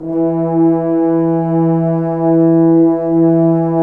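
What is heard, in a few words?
brass French horn mf; Roland - LA Composer vol. 1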